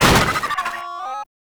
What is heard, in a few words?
Jammer Destroy; Gameplay; Warframe; Nineteeen Ninety Nine; PvPvE